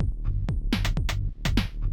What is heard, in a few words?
lo-fi house techno 124; drum loops